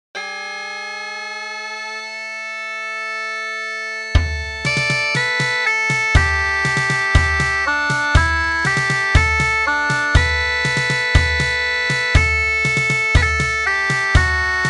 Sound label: bagpipe; Scottish Pipe Band